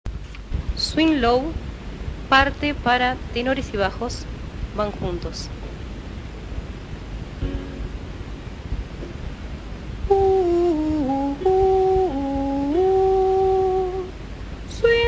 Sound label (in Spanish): Tenores y Bajos; Swing Low, Sweet Chariot